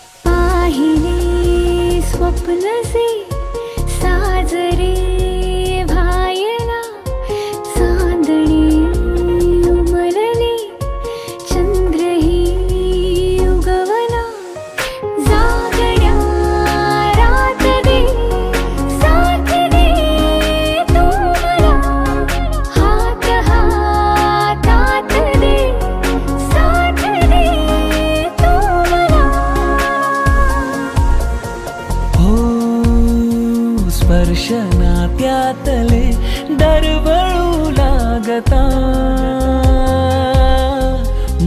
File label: Serial Song Ringtone; Star Pravah Serial